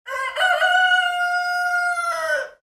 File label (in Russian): petuh